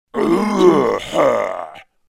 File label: cartoon villain appear